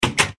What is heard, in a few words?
Door Lock; Minecraft